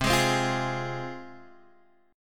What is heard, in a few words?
C Suspended 4th Sharp 5th C, Guitar Open G